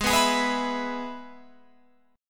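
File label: Ab Minor Sharp 5th chord, Guitar, Open F tuning; strummed; 20,18,20,18,19,18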